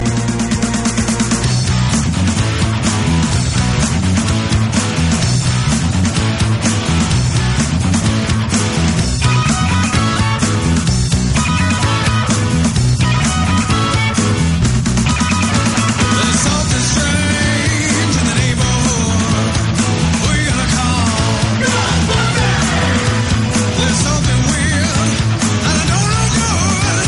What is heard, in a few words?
metal cover